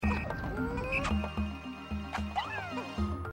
sunset timer; gamesoundopedia

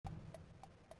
footsteps; iconoclasts

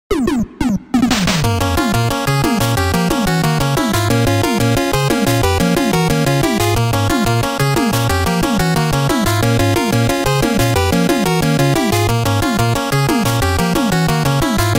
mmsoundtrack; Mega Man 2; gamesoundopedia

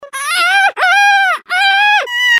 panic; Pikmin 3; gamesoundopedia

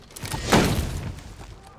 heavy block; for honor

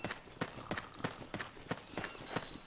crouching

